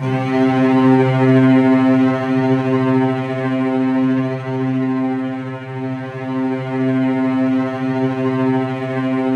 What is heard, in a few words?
C2, Roland JV 2080 TECHNO PAD